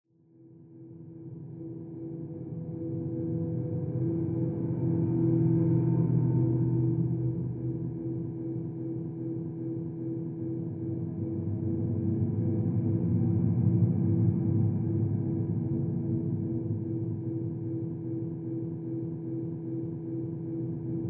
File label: Subway Gas Mask Explore Mood; The Last of Us